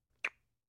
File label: Soft Kisses; Soft Light Kisses. - Fleshy Kisses